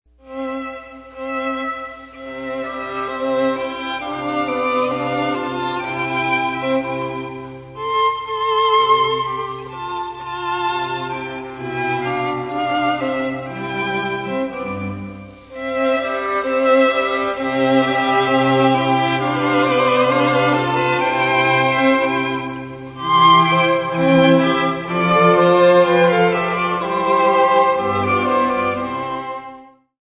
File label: Romance (strings)